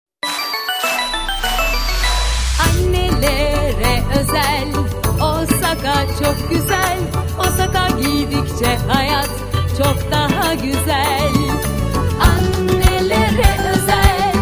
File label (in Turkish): vokal; Jingle Advertising; BiberSA Production